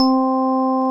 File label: KEY, Organs; Club-50 - Foundations Roland